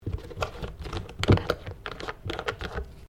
Carton cardboard cap plastic screw off, milk or juice container; Food; Household